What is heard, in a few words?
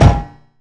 epic metal hard